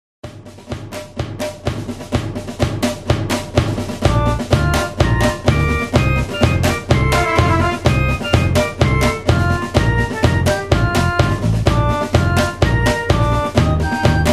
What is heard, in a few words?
Hoedown Patter